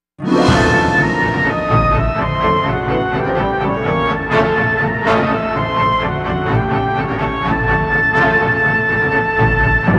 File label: Sitar